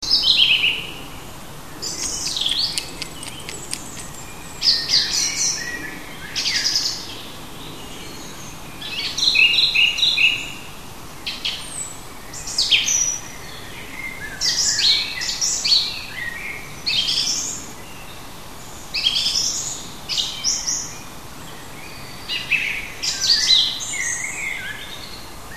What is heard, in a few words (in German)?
vogel heimisch